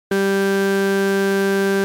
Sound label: Additive Example